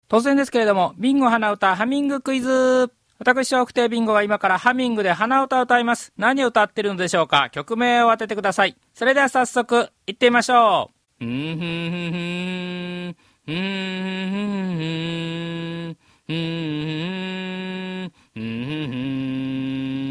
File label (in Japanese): hanauta